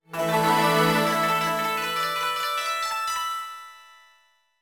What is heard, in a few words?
BGM Download Fanfare; Touch de Tanoshimu Hyakunin Isshu DS Shigureden